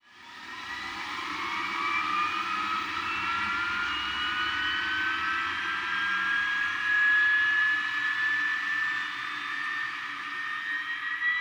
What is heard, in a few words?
F-15 Cockpit Engine Start In; DCS